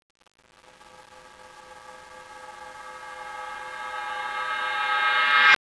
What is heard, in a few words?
REV BELL